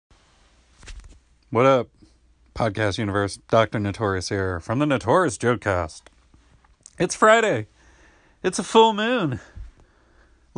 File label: karaoke